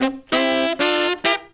SAX, W. AFR.; Spectrasonics; Heart of Africa

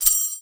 SHAKER, INDIAN PERC

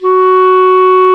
CLAR, Clarinets; Roland LCDP04; 90 s Sample CDs; Clar Cls

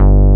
QUB BASS; Pro-Rec - Synthsonic; JV ANALOG1

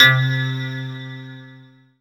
SYN, Roland; Sound & Vision - Gigapack I CD 2

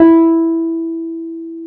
PNO T.Wurly E 5; Keyboards of The 60's and 70's

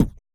perc, Roland MC-909

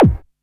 hardbassdrumz, breakcore; Bazzdrumz

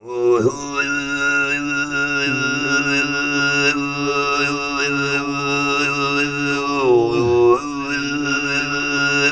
GRP, TUVAN GROUP; Spectrasonics Vocal Planet CD5; World Voices

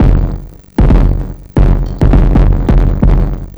Miami Bass Fuzz, Modular Electronica; Sonic Foundry (Sony Creative Software)

